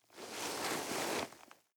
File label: nylon leather soft